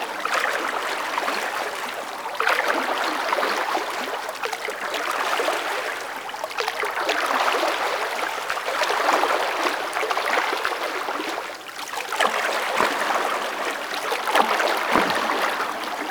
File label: Ocean Wave Lap Loop; Ambience; Props; Warframe; Grineer Ocean